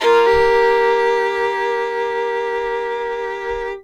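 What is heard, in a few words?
fiddle hammer D#4; Sampleheads; Whole Lotta Country 1 & 2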